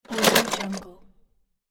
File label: metal item drop